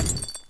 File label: chimera lava die